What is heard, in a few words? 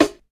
original hip hop steel snare drum sample f sharp key